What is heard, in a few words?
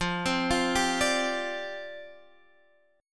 C Suspended 2nd Suspended 4th, F